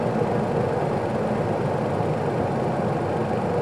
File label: police car drive ext; Original TK Sounds